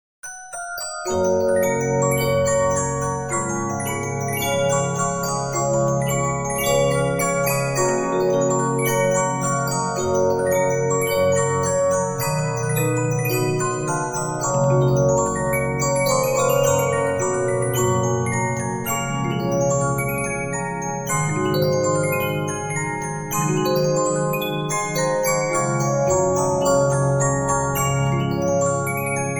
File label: Regina, 15inch disc musicbox